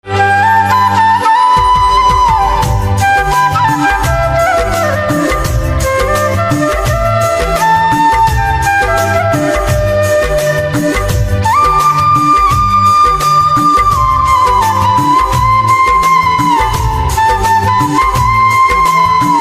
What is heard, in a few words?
Flute